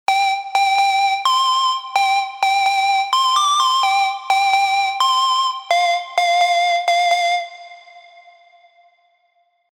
Sweet Lead; spire; MYON

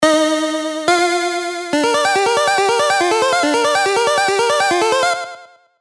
CHIPTUNE, Serum; Batman Returns

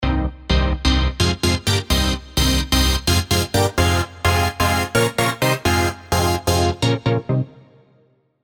Creepy Ass Stab; spire old skool

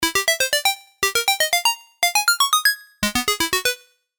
Sylenth1 Level Up At C4; CHIPTUNE

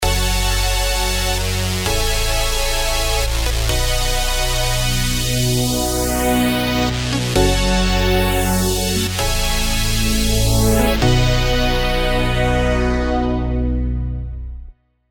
Heavy Weapon; serum super saws

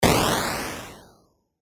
Serum, Boom, chiptune

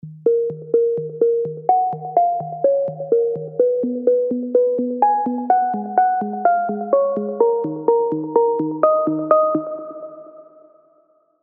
Lonely Beacon; Serum

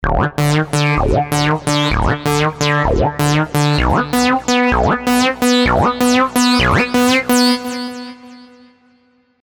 arp, Pablo Funk, Sylenth1

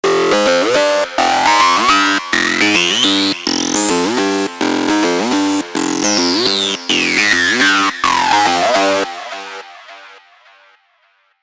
Acid Loop F 105 BPM; Spire; Zen Rabbit; ACIDVSLASERS1

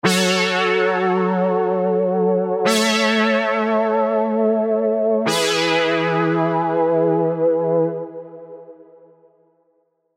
Brass; tropical pops; passionfruit

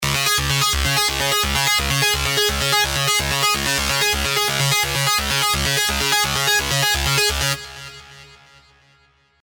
Synth Loop D# (128 BPM); Sylenth1

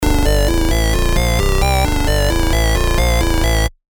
Sylenth1 Keygen; CHIPTUNE

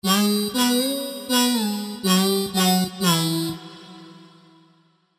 Funky; tropical pops

